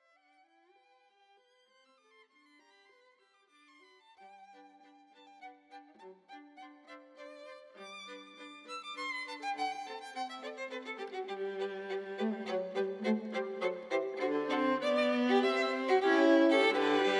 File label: tempo di menuetto; ludwig spohr